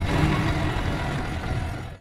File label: casino Fast